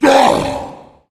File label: tank throw; Left 4 Dead; Source Engine